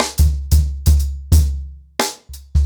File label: 90BPM, ACOUSTIC DRUMS